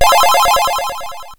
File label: Coin; SolarWarrior